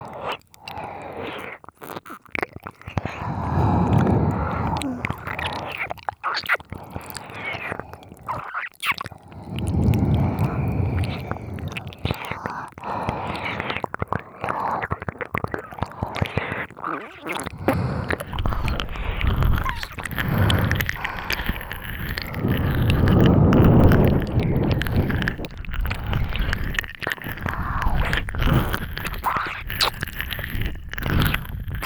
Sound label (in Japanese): ASMR, まったりMIX両耳